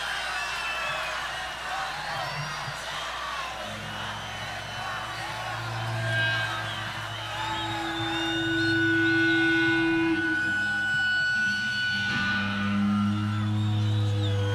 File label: Encore Jam - Live; at Tushino Airfield, Moscow, Russia; September 28th, 1991